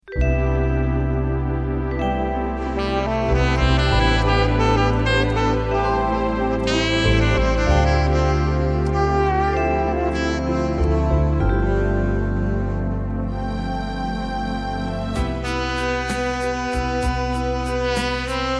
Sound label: sax; contemporary ballads